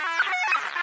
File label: radio random, prison; ambient; source engine; hl2; video games